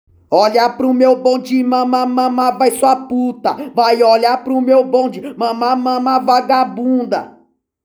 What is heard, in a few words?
ACAPELLA